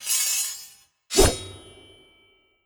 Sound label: Ammoutjudge Sword